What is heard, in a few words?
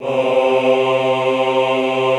AHH C2, Classical Choir; Best Service; Extended Classical Choir